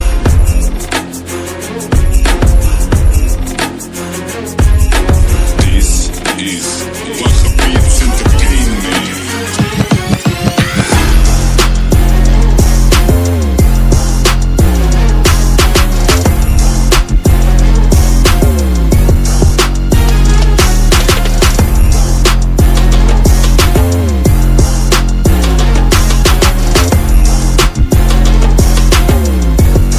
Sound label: Arabic trap ringtone